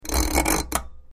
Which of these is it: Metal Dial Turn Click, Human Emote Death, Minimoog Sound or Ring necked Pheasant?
Metal Dial Turn Click